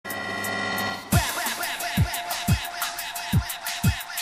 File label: Drum and Bass Jungle and Old Skool, UK Bass Radio